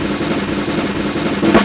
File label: drumroll